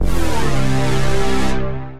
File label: Bass HOOVER, Synth Bass; Ableton; Factory Packs; Beat Tools